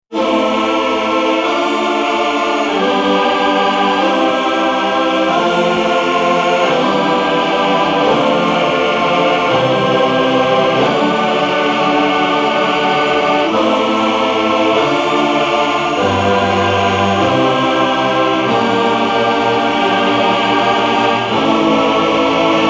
Combined Choir

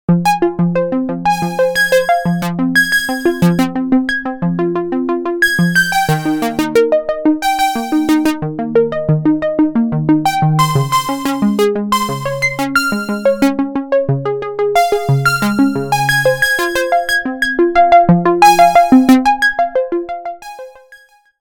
Arpeggiator accents, sequential

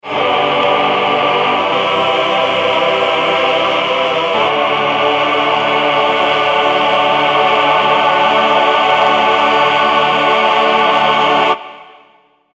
male choir

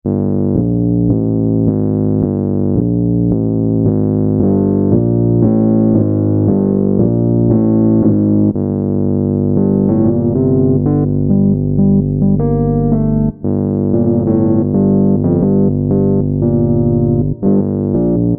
fender rhodes piano bass

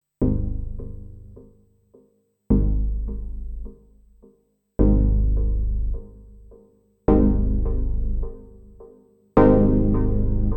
Swarm Chords

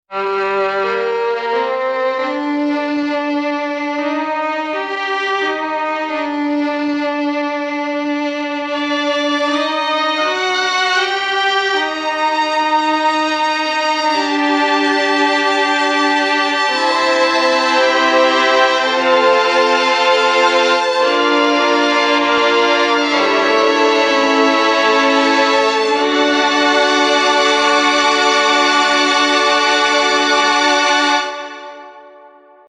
Violins; BARCLAY JH

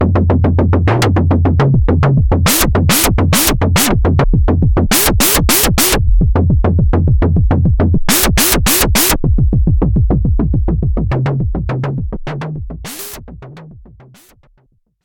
Toms, sequential

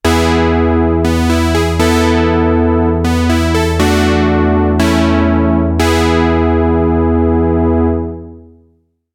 80s Poly, Fourm, SEQUENTIAL, シンセサイザー

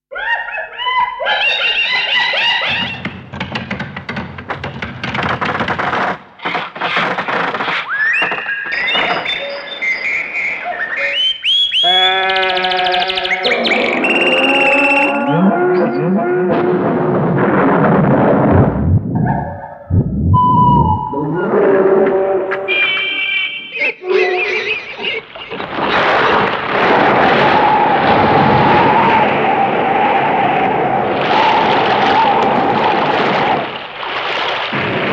nature

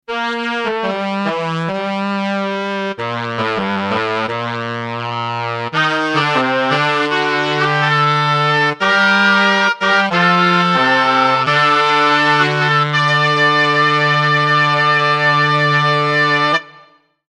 brass

